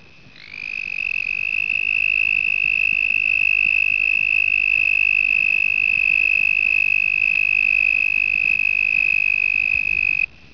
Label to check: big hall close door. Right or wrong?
Wrong